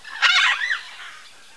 Bird, animals; valve; ambient

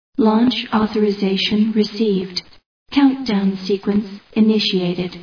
launch, space; valve; dutch

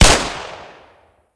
gun; valve

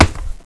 concrete; valve

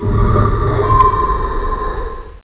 pain

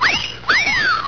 whistle wolf; valve

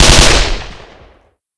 mgun; hgrunt

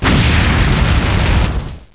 flame blast; valve; creep

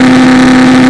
impreza engine; valve

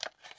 clip release; valve

